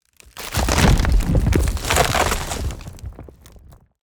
creature wood texture crack heavy rumble; Forest Creature Sound Effects; Bluezone

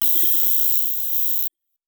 Data Processing, Sci-Fi Systems; David Dumais Audio

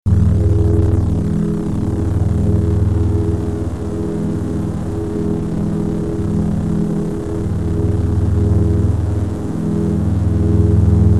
Double Bladed Saber Sound; FXHome

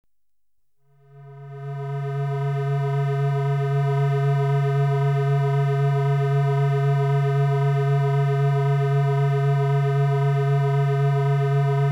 Digital Hum, Electronically Generated Sounds; BBC Sound Effects Library

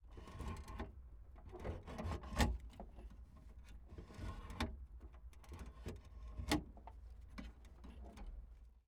Me 109 foley power lever; Messerschmitt Bf109 G4; DPA4017; Pole Position

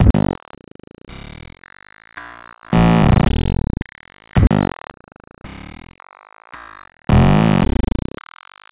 Loop, 110 bpm, Stutter Sounds; 99Sounds; Mangling Audio

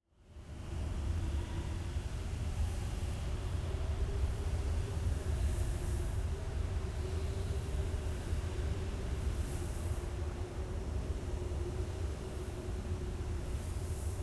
Wind Gusty Strong Whistles; NoiseCreations; Aura

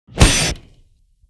HITS; 99Sounds; Cinematic Sound Effects; Generdyn

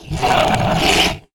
Monster Call; David Dumais Audio; Monster Sound FX Pack 1

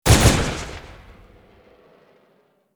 Bullet Time; FXHome; Bullet and Gun

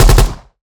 AK-74 spot A close burst rounds; FLYSOUND

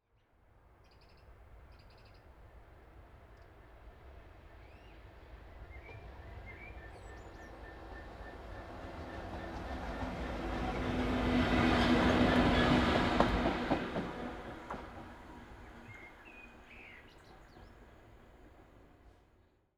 Train Pass By, Long Approach, Countryside; UK Traffic & Vehicles

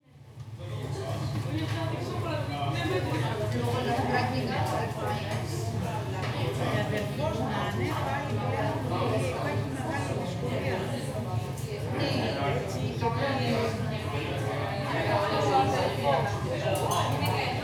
Barcelona Burger Bar Many Tourists Crowd Walla; GainWalkers; Barcelona Ambiences

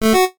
jingles retro; Kenney's Sound Pack; Music Jingles

